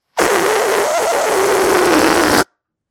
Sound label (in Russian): zvuk sduvajushhegosja sharika